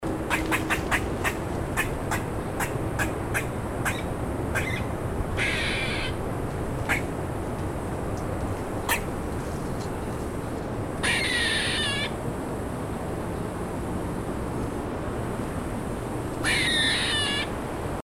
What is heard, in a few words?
eastern gray squirrel call; chesapeakebay